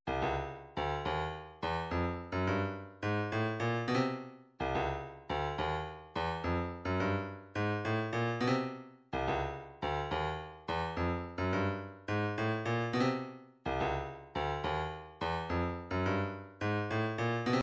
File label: 106 snare